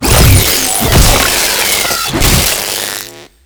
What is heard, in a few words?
Proto Infested Ancient Tentacle Swing Flesh; Warframe; Abilities